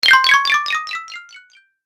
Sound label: Win Bonus With Delay, video game sound effects